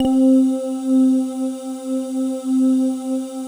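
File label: PAD, atmospheric pads; Best Service; XX Large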